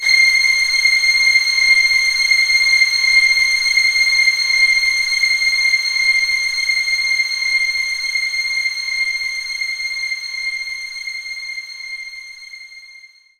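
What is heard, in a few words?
String Loop C6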